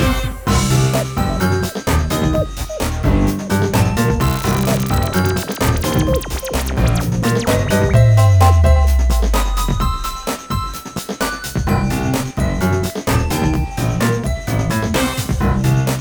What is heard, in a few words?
Theme Menu Loop; Zubo Toobo; nds